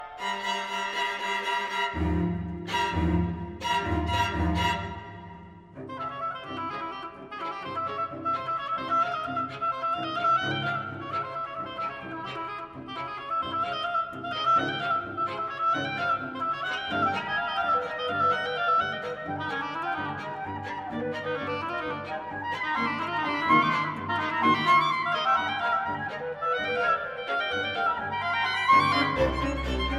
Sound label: Oboe, Clarinet and Strings - Furioso